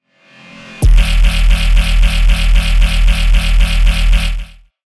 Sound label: Bass Impact; neuro; BIG5AUDIO